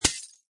Bullet Impact Glass, Projectile impacts with glass; Bullet hit; Combat